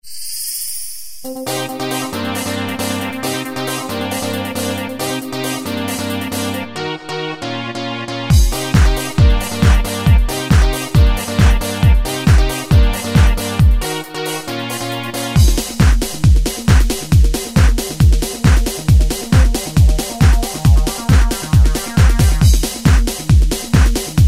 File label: Minimal Trance, Yamaha QY70; Preset Styles